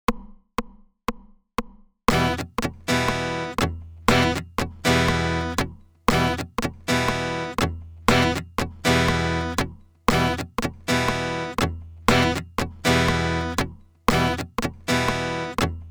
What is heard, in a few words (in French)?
Rythmique ghost notes 120